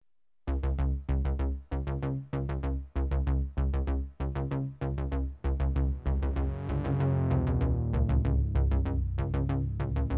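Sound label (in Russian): синтезатор ритм погони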